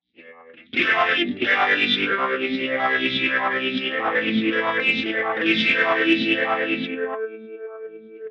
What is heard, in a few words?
Vocoder; Moogerfooger MF 105S; preset Video